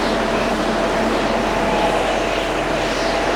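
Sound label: grenade jump loop; tf2classic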